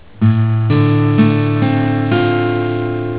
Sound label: chd dm7, guitar